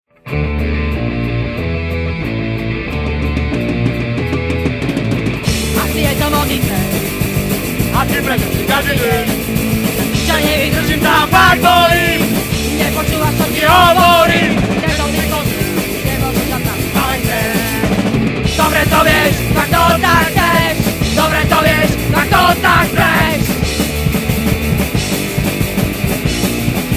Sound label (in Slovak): HLAS